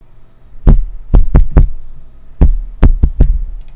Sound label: drum, mikrofon teszt